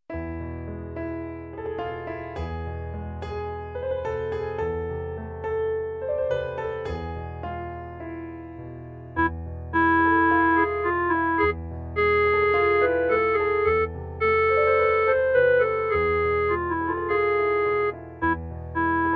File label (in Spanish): CLARINETE; Hava nagila